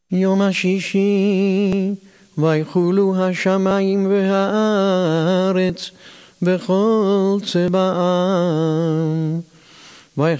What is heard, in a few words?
Kiddush Friday Vaychullu Hagefen only